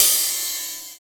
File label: Rides, Cymbals; Vengeance; Essential Clubsounds Vol.4